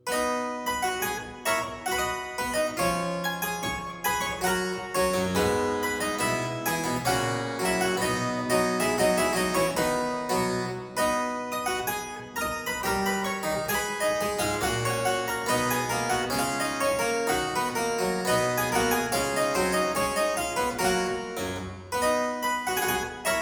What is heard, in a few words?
Aria in C major Variatio 4, Harpsichord; Buxtehude; Complete Harpsichord Music